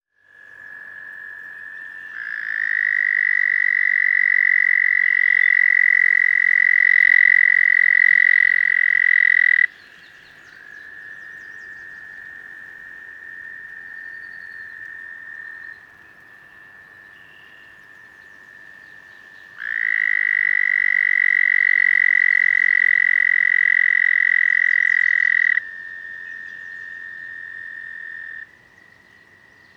american toad anaxyrus americanus; lyagushek i zhab

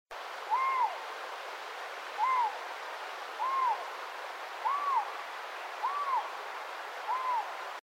canadian lynx lynx canadensis; myaukanya koshki

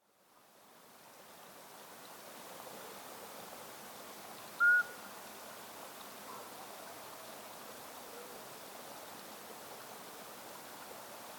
Iberian midwife toad Alytes cisternasii; lyagushek i zhab

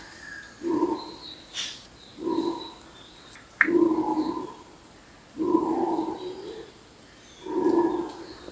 guianan red howler alouatta macconnelli; revuna obezyany

